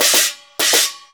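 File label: CHINA LG8 L, China cymbal; Spectrasonics; Heart of Asia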